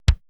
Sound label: 80S DRUM SET